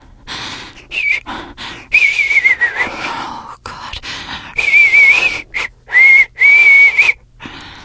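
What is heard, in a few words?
nervous whistle, teen girl; video game; Left 4 Dead; Source Engine; survivor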